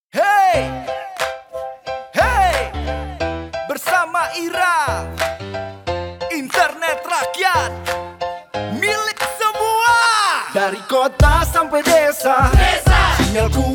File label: Jingle